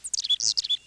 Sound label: gargle